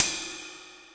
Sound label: CYMBAL, Yamaha RM 50